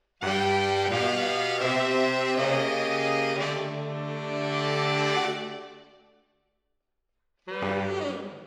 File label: MKH AB, piano